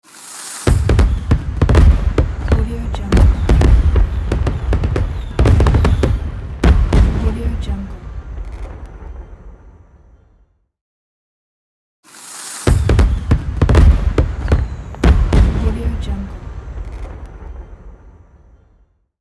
fireworks; sfxroom

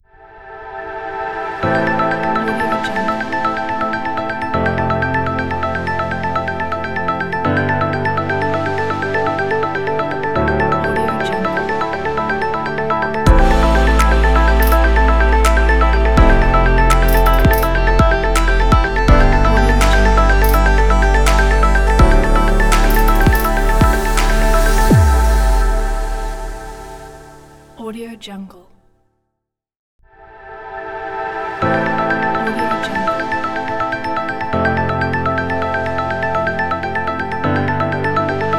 inspiring futuristic technology ident

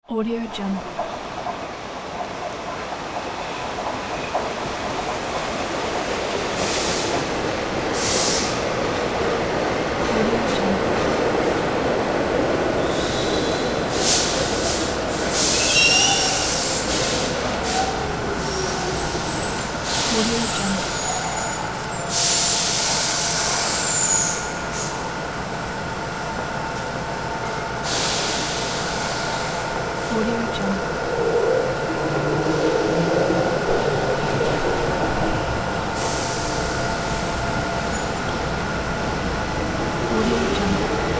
subway platform ambience

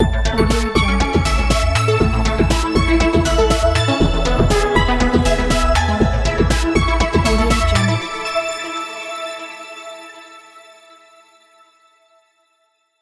opening logo

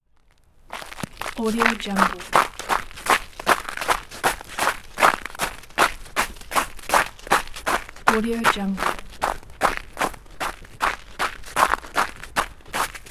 running and slowing down on snow

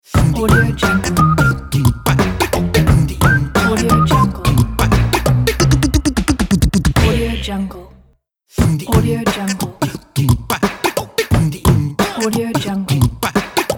logo funny stomp

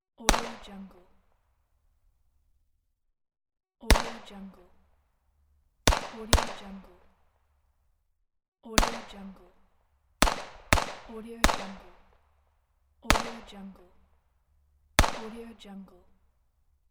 glock 9mm hand gun gun shots

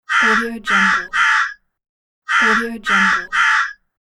crow caw

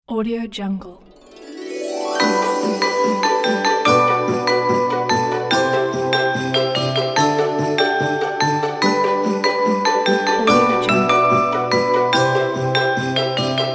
xmas intro logo

